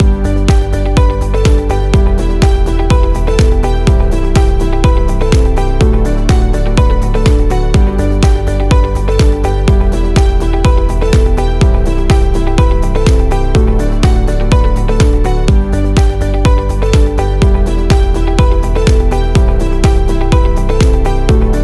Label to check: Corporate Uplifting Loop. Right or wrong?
Right